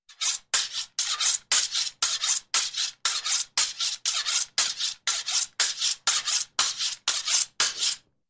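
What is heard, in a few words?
tochilo, whetstone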